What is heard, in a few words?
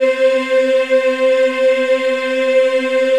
C4 MW HI, choir; USB Soundscan vol.28; Acoustic & Synth; AKAI